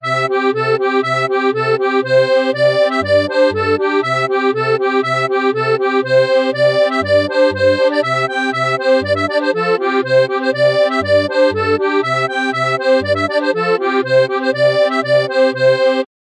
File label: irish polka